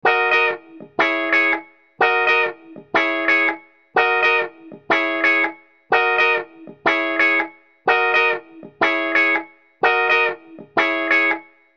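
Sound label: Musical Element, Drum Snare March; Blastwave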